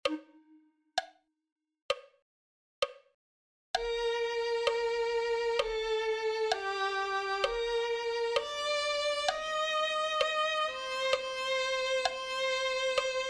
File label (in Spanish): en el dia del mal violin